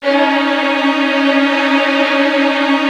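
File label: Virtuoso Strings, violin trills, C#1; ILIO; Kirk Hunter Virtuoso Strings VOL-1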